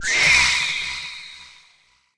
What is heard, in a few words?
Catch